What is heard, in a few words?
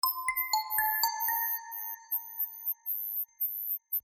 simple remix sms tone